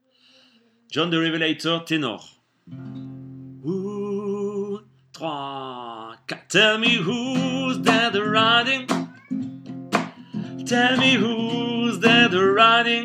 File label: tenor; jon the revelator